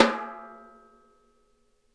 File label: snare; Sonic Reality; Interactive Drum Kits